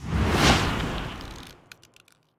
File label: enchantment frost